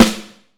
Vintage Snare Drum; 6.5X14; Q Up Arts; Johnny.C's Vintage Snare Drum

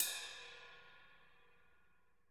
ride 1,drums; KITS-LARGE

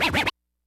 HITS BITS; Kid Nepro; Elite

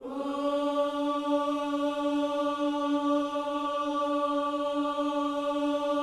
OOS, Gospel; ILIO; Vocal Planet VOL-1

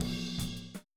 Boogaloo, Tom Rack, 82 BPM; Drums; Verse; Sonic Foundry; Drums from the Big Room The Mixes

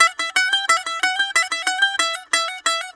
180, Mediteranean Traditions; USB Soundscan vol.53; AKAI

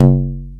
Tube Low G; Multisamples; Digital Sound Factory Planet Earth

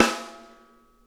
Interactive Drum Kits, rim

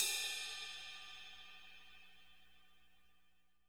CRASH 2 TIP, drum; 90s sample CD

